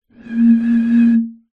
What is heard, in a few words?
foley bottle wine blow air top liquid inside